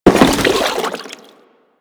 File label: Crewman Land Water, Footsteps; Warframe; Corpus